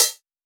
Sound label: G House Open Hi-Hat; Drums; One Shots; Cymbals; Hihats - Open; Cymatics; EDM Starter Pack